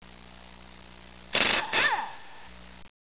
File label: ratchet